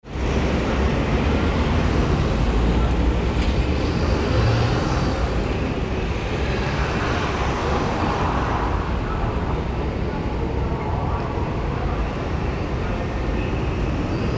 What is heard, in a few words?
street big city